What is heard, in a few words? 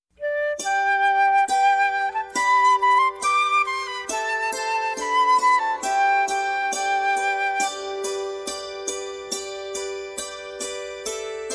simple gifts instrumental